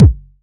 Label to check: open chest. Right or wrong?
Wrong